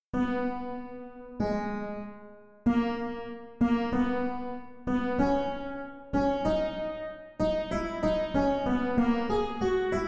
Tema di fuga in do maggiore; Fine 1802 – Principio 1803

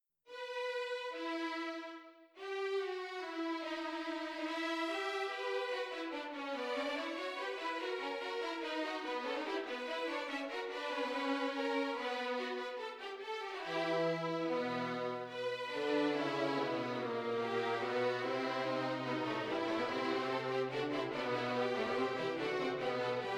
Orchestrazione; Ronge; Gerd Prengel

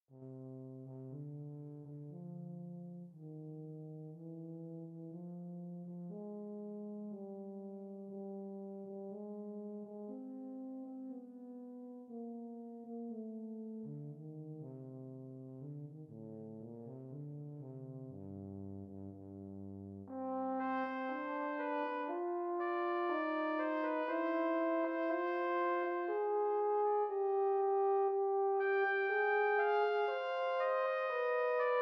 Requiem per quattro voci o per strumenti; Halevy F.

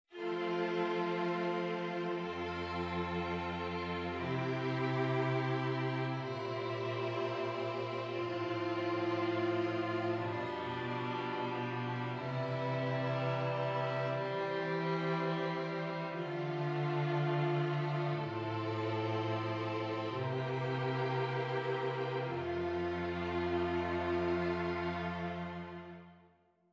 esercizi di contrappunto semplice A quattro voci

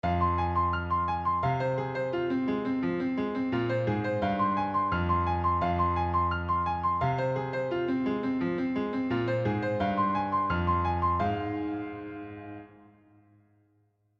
Abbozzi per un esercizio per pianoforte

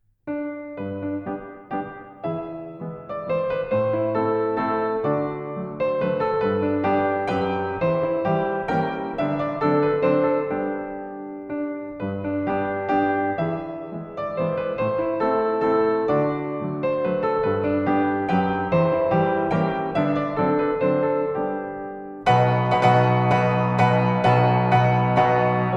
Valzer pianoforte; von Anton Wranizky

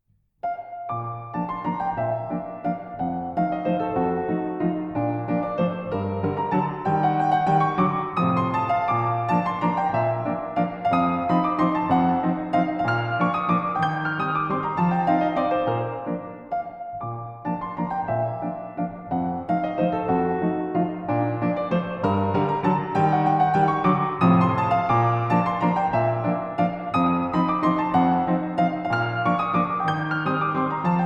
pianoforte; Von Leopoldine Blahetka

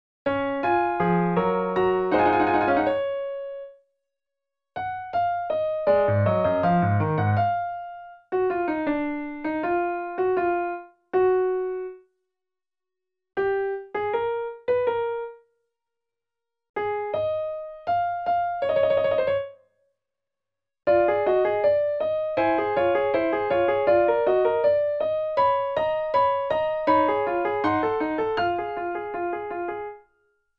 Sonata per pianoforte

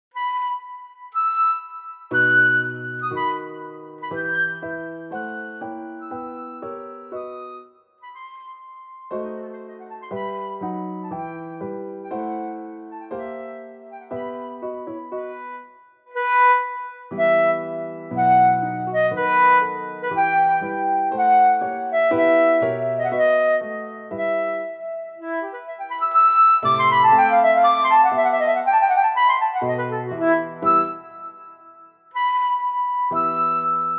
Allegro moderato per flauto e pianoforte; Gabrielski W.

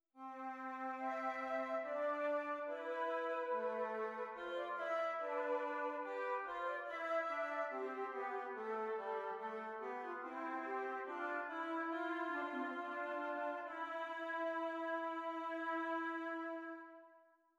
esercizi di doppio contrappunto nella decima; Ronge pagina 169

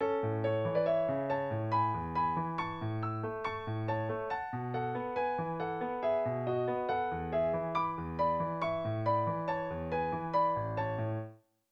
Abbozzi di un pezzo per pianoforte in La maggiore; riferentesi alla bagattella

